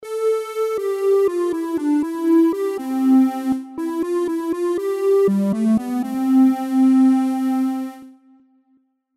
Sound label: melody